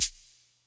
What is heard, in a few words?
reverb shaker hi hat d key, shaker percussion tuned to the D note